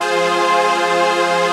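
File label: chord pad, Pads; ATMO PADS; Best Service; XX Large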